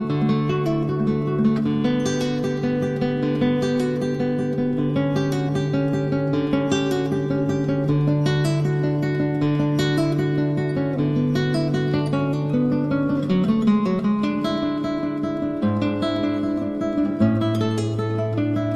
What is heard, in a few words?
Cello Suite No. 1- Prelude